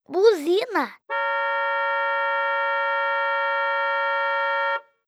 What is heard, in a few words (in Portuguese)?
Buzina